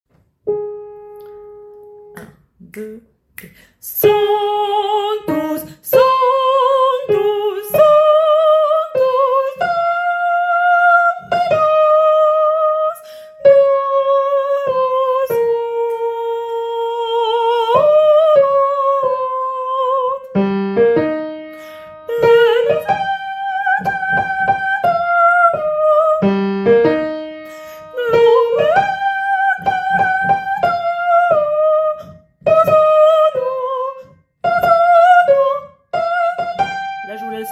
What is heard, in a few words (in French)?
soprano